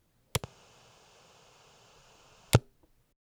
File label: Radio on, off; SFX; IRL Recorded